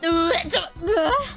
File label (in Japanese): 嗚咽; 情けない声